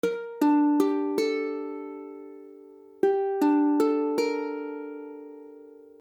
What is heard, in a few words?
Gmadd9, akordy ukulele